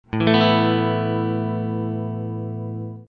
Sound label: asus2 open; fretjam